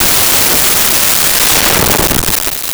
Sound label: Rifle